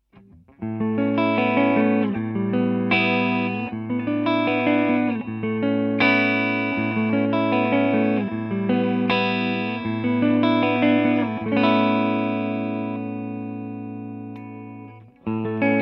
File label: Clean Chords